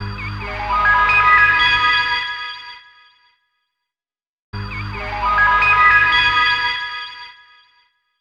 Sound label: Whirly FX; Sonic Foundry (Sony Creative Software); Club Joints and Street Anthems; Lions Den